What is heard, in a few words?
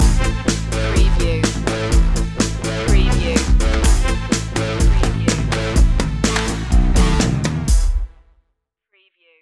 retro video game krypton stinger